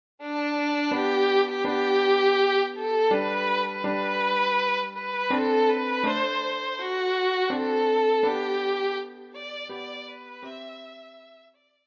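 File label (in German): violine; O Tannenbaum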